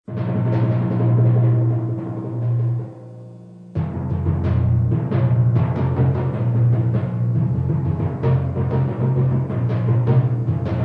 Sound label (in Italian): grand cassa